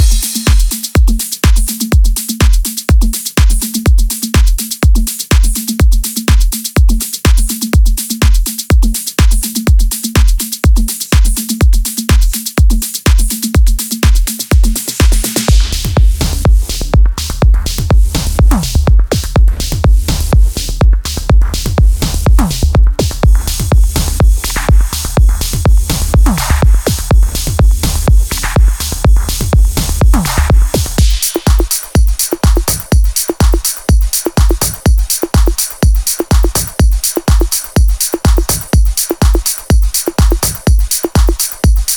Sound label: Progressive Drums